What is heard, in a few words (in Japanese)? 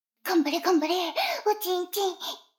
シコシコ音声, ASMR; 逆時間停止されて